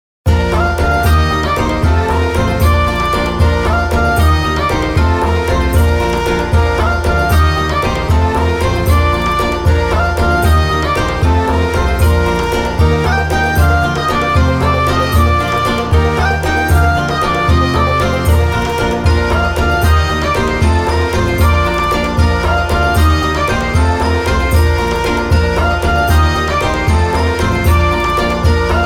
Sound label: bgm ethnic; maou